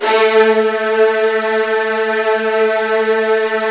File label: A1, Virtuoso Strings